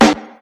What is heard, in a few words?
old school hot steel snare drum D key, steel snare drum tuned to the D note